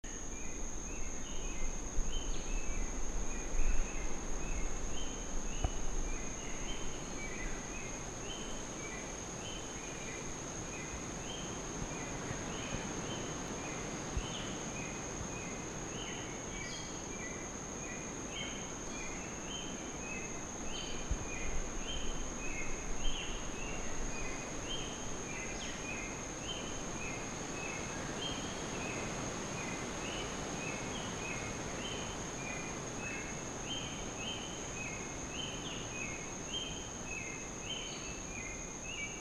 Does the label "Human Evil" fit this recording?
No